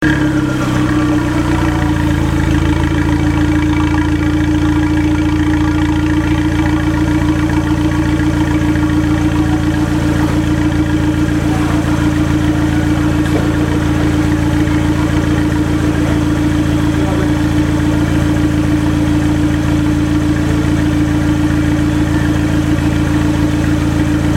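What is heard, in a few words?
muffler center idle; Giulietta; unicorse-italial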